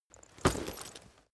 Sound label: leather metal impact soft